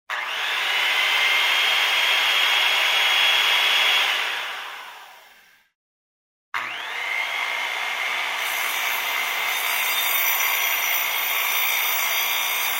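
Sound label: sound of a circular saw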